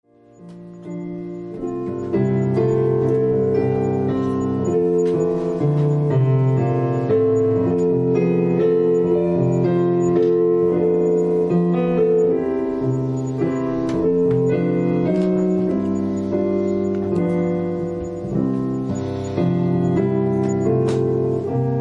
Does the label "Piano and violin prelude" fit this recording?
Yes